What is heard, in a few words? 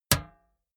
can hit damped crunchy; whooshes rises; hits impacts